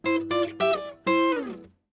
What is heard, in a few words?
Guitar